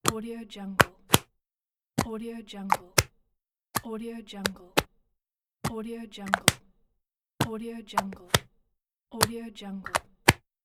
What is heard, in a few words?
plastic cap open and close